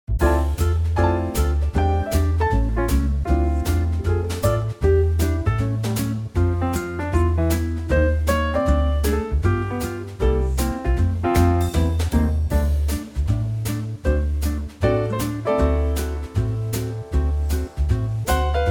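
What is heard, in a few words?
F 156 bpm, male; Quartet; key - F - vocal range - C to D; Quartet arrangement of the well known jazz classic, at a great tempo that's perfect for a swinging vocal.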